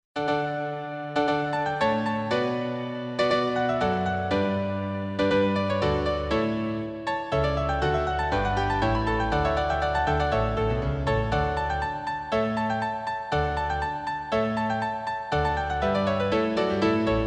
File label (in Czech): Vivaldi koncert D dur; svatby